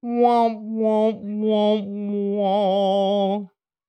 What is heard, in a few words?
Trombone, sad; Foley; Let's Learn Everything!